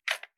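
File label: gear rattle weapon medium; viper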